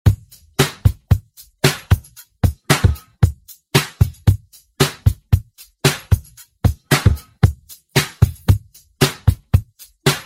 Funk 114Bpm

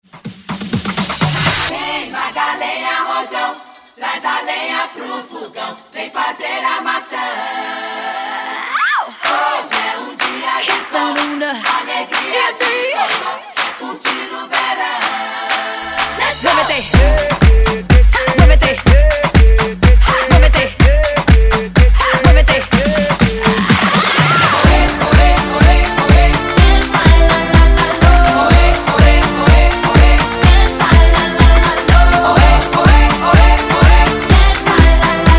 Afrobeat Redrum

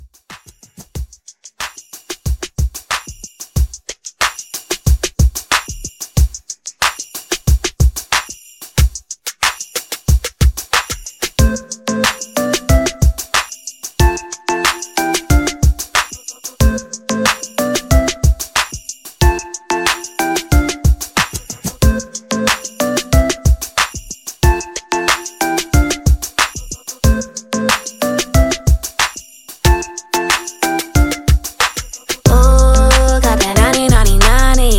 Re Drum 92

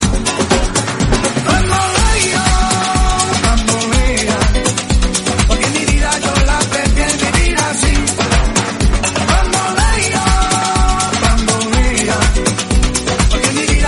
123bpm Fm

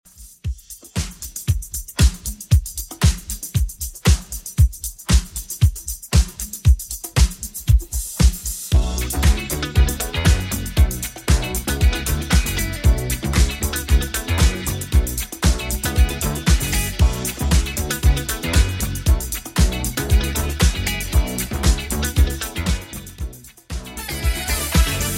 80s Redrum 116bpm

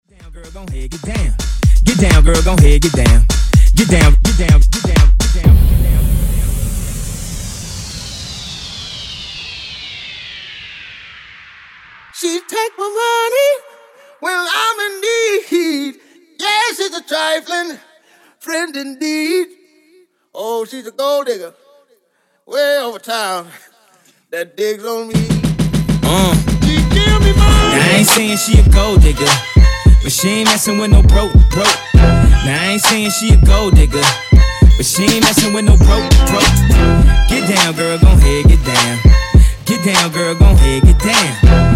Funk Breakz 126Bpm

